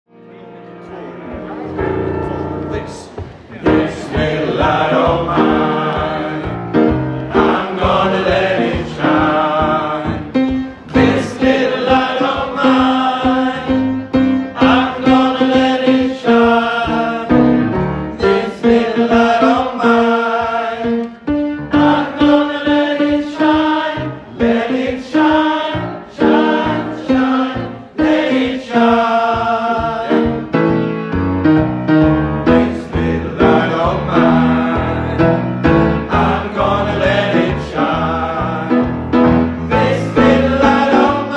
This little light & Go tell it Ten

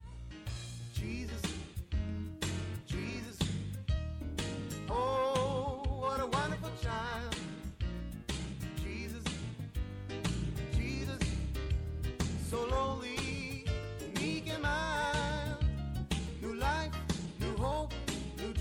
Jesus what a wonderful child Ten

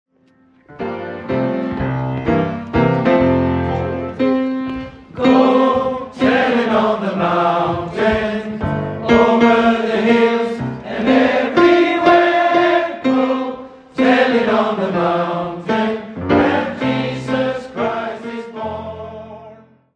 Go tell it on the mountain Ten

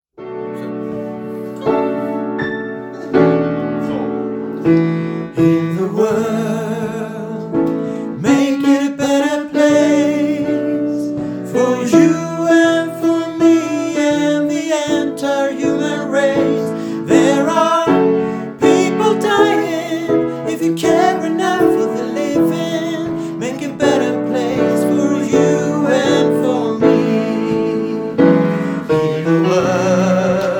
Ten & bas

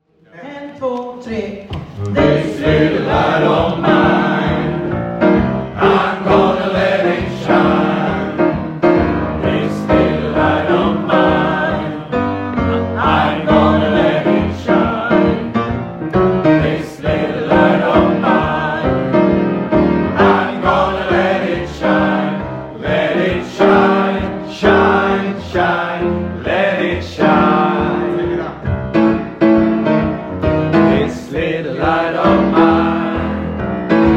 This little light & Go tell it, Bas

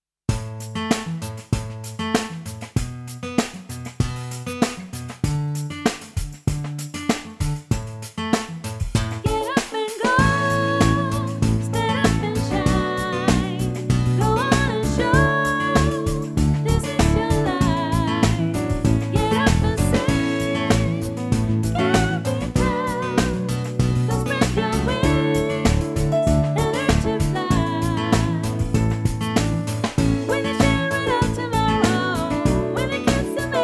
Sopran

